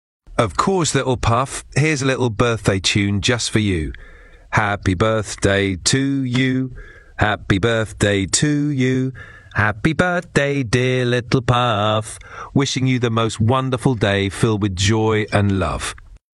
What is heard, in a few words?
Birthday Chinese